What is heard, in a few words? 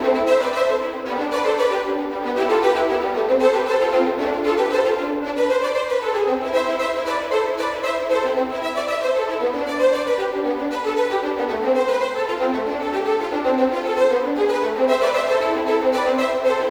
violin